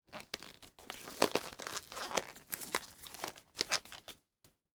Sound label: Wear Rubber Hand Gloves Surgical